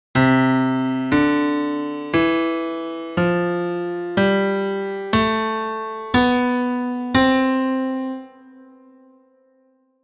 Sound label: C Major